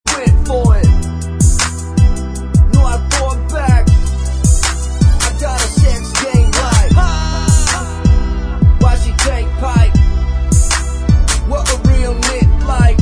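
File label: backing vocals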